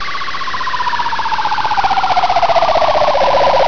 ZOOM

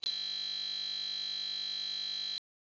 HURDLES; ARCADE

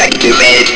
activate; BounceBall

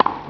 BALL

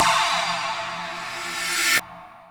gong; MAGIX; EFFEKTE

